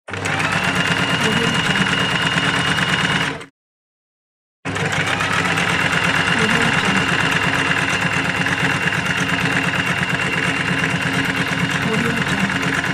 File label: sewing machine